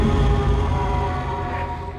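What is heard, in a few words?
Music Volcano 120; From Dust; ps3; xbox 360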